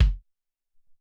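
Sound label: Solid Kick 2, Alesis SR-18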